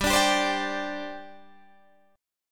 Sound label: G Suspended 4th, Guitar, Half Step Down